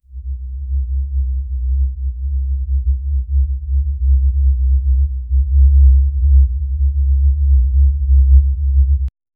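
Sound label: a soft ominous item pickup, unsettling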